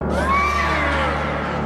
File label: scream; zant